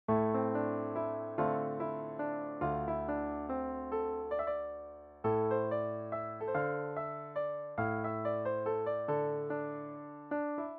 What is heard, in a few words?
Brazilian Guitars